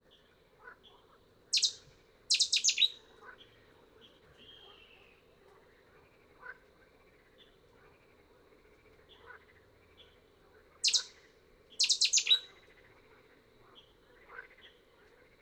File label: pre dawn